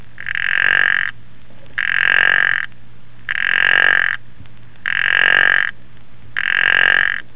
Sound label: zanzibar puddle frog